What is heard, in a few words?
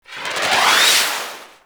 Swarm Missile Projectile; Enemies; Warframe; Eidolon; Jetpack Marine